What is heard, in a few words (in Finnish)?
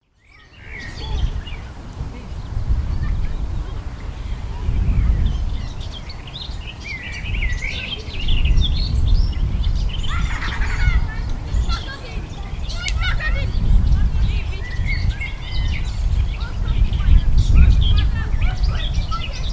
lehtokerttu; linnut